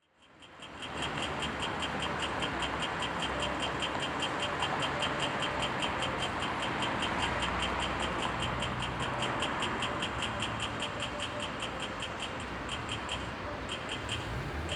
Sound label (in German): AMPEL, Stadtgeräusche